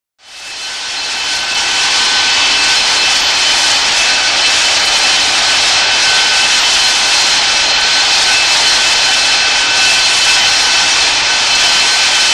Harrier Jump Jet Increasing Engines And Taking Off.; Start / Take Off; av-8b harrier